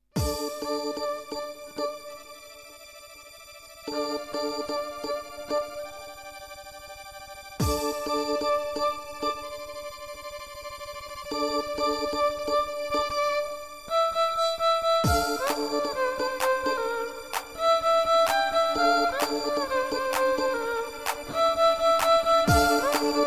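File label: strings duo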